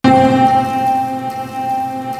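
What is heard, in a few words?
D50 drop guitar; East West; Global Sounds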